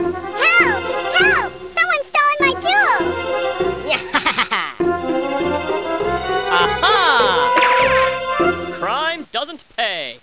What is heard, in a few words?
puppets